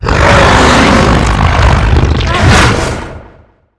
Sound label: death